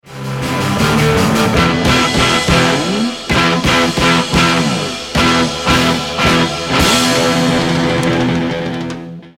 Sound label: Guitar riff